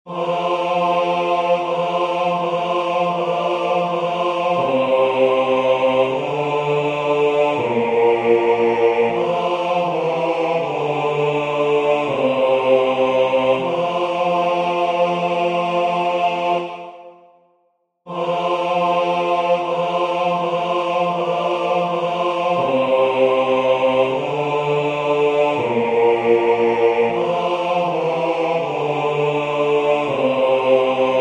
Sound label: bass; Lo How A Rose